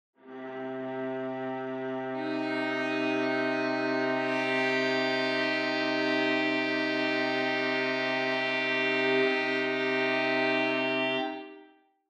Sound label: chord minor ET